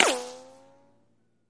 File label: Bullet Ricochet Sin